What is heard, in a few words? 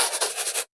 Writing